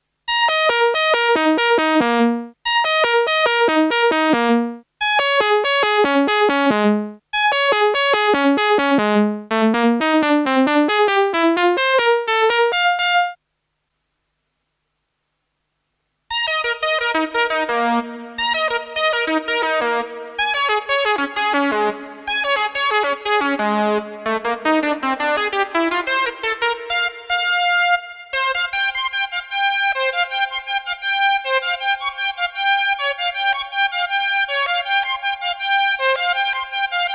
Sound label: SY 1 no growling dry and wet; Growlpet